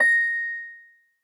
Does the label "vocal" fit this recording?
No